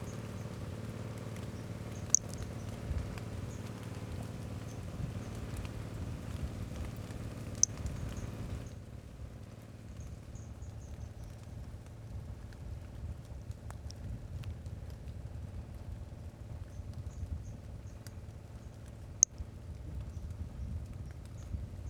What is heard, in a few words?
Brachycephalus albolineatus individual; unvouchered